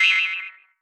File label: baby jump, jumping slime; edgar; enemy